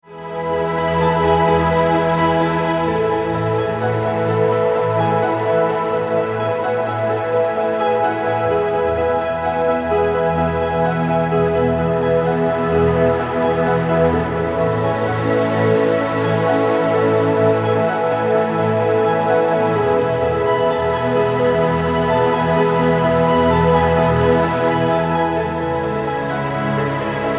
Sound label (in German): Entspannungsmusik